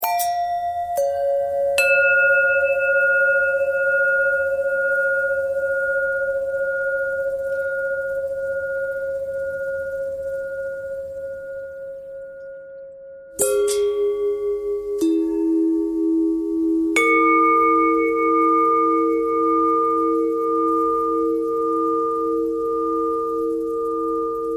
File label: bell longbell thread bendthread; bells